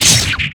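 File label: Battle Spear Critical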